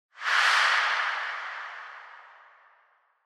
Noise Sweep Long

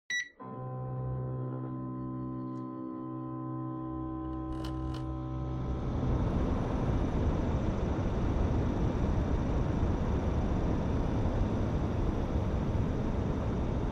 Air Conditioner All

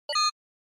Short Accent Dry

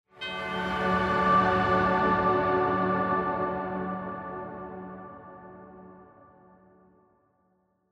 Angel Long Bell

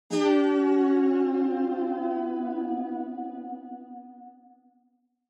Onoma Negative; オノマトペ ネガティブ

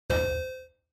Quiz Button Low

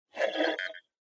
Portable CD Driving Noise Seek

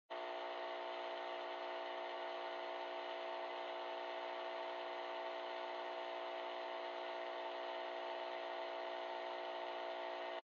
Radio Signal Noise Far AM

